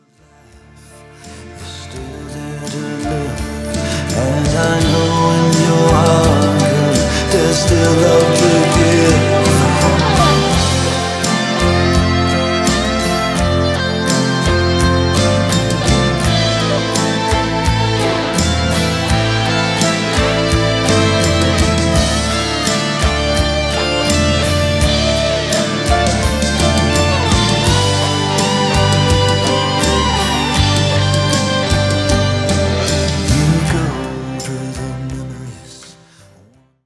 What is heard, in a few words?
GTR SOLO